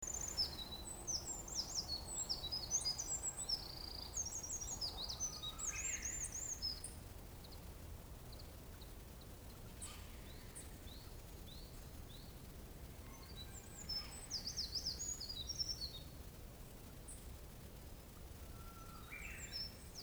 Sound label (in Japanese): misosazai